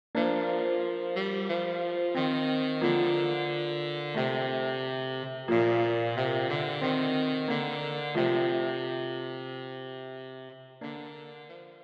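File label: tenor saxophone, für Tenorsaxophon und Klavier; Alle Jahre wieder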